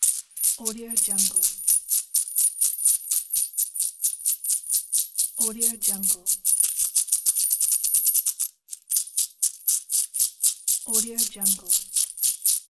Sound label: shaking coins